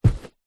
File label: footstep single carpet wood floor boots walk; foley; zapsplat